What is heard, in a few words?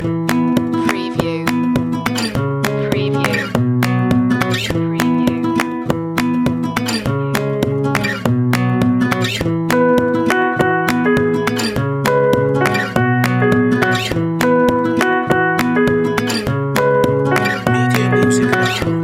backyard lullaby